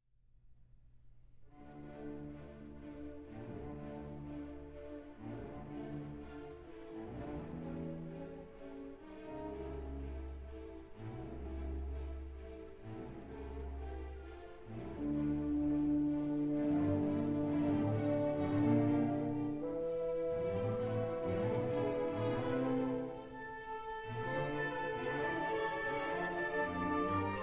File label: Piano Concerto in D minor, Allegro